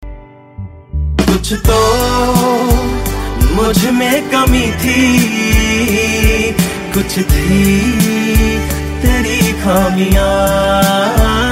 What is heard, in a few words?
Ringtone, Punjabi